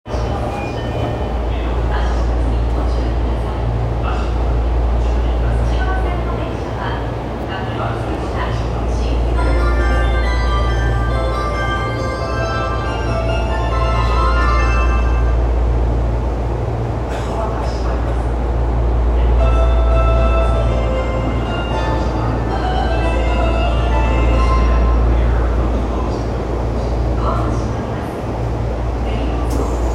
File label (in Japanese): 有楽町線江戸川橋西東, train sounds